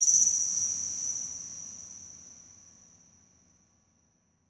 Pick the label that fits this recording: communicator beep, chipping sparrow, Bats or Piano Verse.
Bats